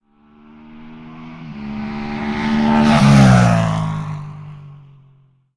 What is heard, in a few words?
motorbike; Blind Drive